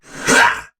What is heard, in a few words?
Attack Effort; en; Warframe; Battle; Dax; DaxKnight